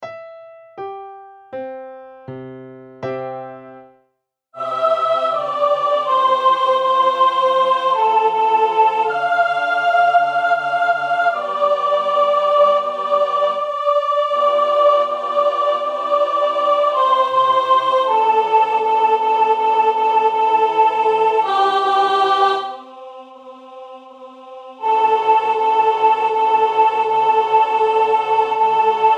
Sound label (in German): Largo Sopran; Salve Regina